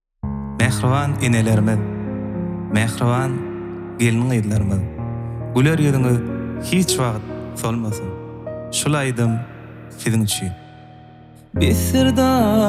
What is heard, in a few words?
piano cover